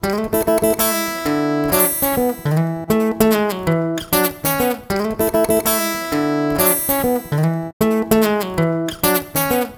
Traditional Guitar 098bpm A, African Guitars; Quazibeat - African Secret

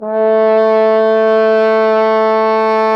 FRH, Horns

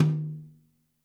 TOM; Zero-G; Chemical Beats

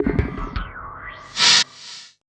RISK FX, Industrial Loops

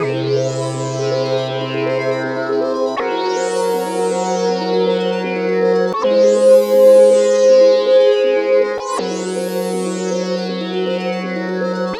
philter (suspender); Sonic Foundry (Sony Creative Software); Ugly Remnants VOL-2

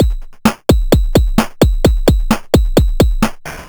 Crushed Beat 130BPM; musicradar

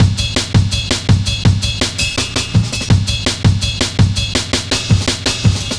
Samurai, Drum Loops, 165.5, Jungle; Zero-G Jungle Warfare 1, 2 & 3

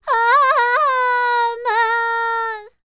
Vocal Planet World Voices, NOMADIC

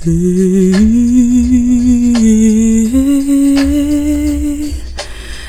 FEMALE VOX, Hip Hop

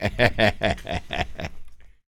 LAUGH, Jazz; Spectrasonics Vocal Planet; Jazz FX

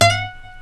F#5 HANS NYLON, Guitars; Spectrasonics; Hans Zimmer Guitars VOL-1

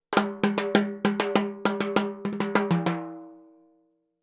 Timbali, Latin Percussion; Sonic Foundry (Sony Creative Software)

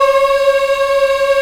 F C#5, CHOIR; Spectrasonics; Heart of Africa 1; CHOIR MMS

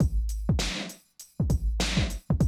drum loop, 100 BPM

